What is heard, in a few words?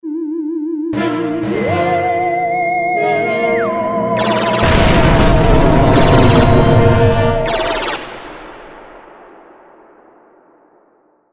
alien